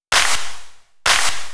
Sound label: Clapsnare, 128 BPM; Vengeance Samples; VTDS2 Song Kits